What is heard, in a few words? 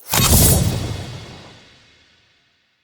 Sword Scan Success Stinger; Warframe; Sacrifice; Courtyard